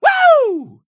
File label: woo